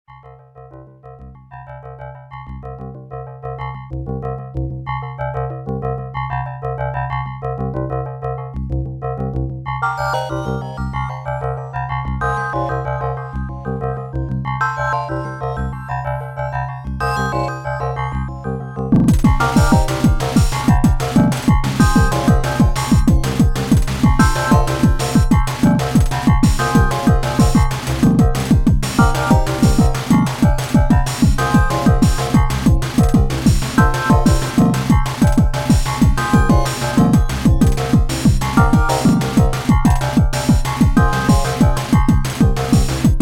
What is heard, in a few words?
Wild Ambience; bare knuckle